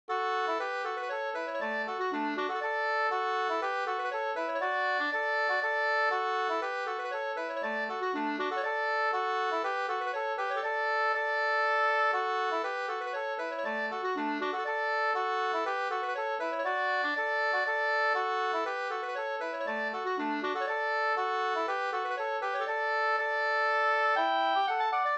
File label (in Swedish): polska Bingsjopolska; Kalkbacken e Pekkos Helmer 2st